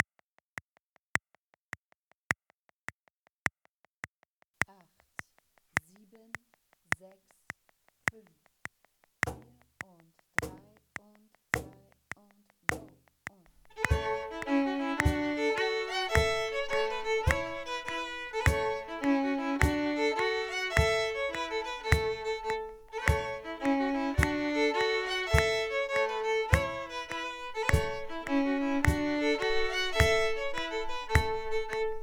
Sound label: Play-Along Cajon; Perkussionist innen